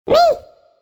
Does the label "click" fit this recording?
Yes